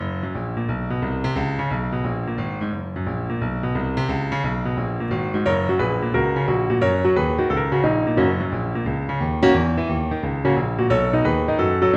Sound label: Boogie - Broken Octaves Left Hand